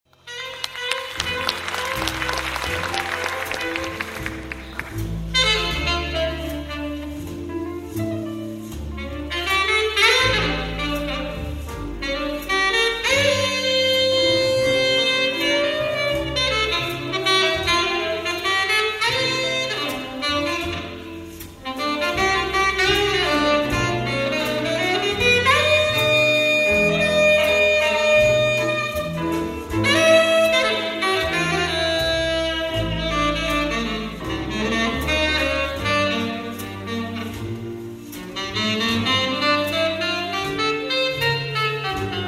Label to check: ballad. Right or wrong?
Right